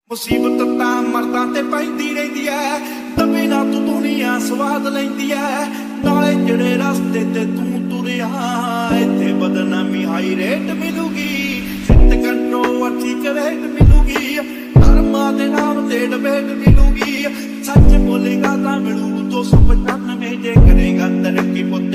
song ringtone punjabi song ringtone punjabi ringtone